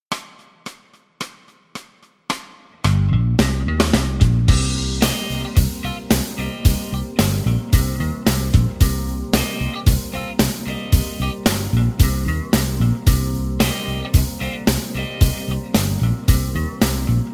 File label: No Lead Guitar